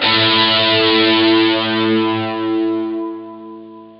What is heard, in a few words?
GUITAR, POWER GT